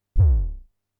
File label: bd crunch, Drum Machines, Formanta Radio Plant Polivoks